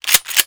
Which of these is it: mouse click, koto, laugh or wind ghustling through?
mouse click